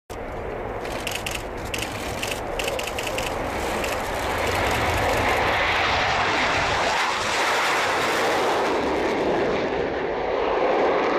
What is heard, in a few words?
MiG-29, جنگنده میگ 29